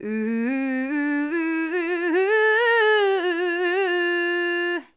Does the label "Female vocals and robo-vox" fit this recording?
Yes